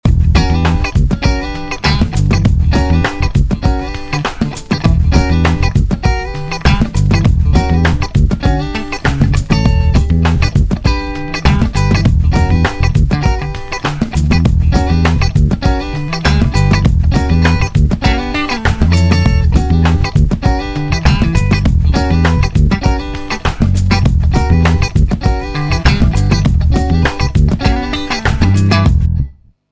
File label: Funky; Maranello